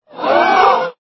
crowd gasp; reverse

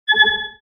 ping

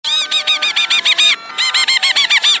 laugh; tidus

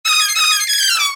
horn

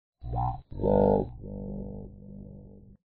meep marp

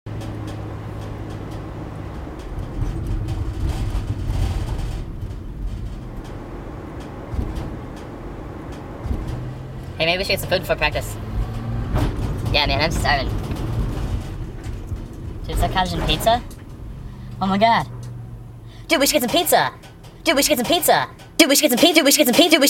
pizza tune

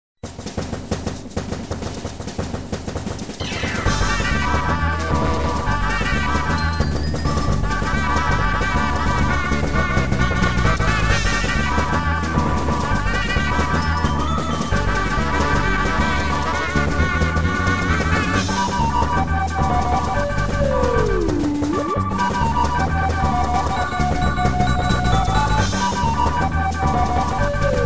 fight music; dota

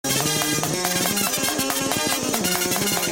ska

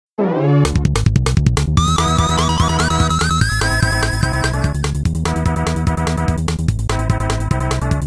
stage clear dixie

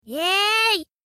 yay; reverse

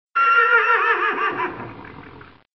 horse

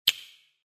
switch

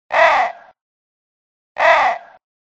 crow

